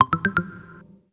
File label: ActiveSync start, phonetones Samsung SGH-i300 system